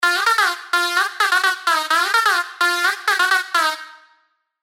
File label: LD, sylenth1 dirty dutch leads; Pannenkoek; revealed sylenth1 dirty dutch leads vol 1